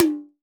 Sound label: Toms